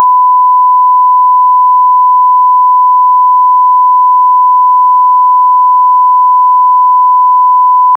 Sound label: long bleep censoring inappropriate words